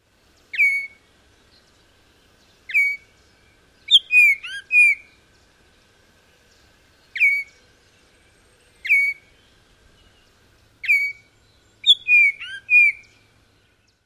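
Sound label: songs and whistles number 3, oriole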